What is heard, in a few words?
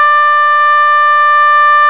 oboe re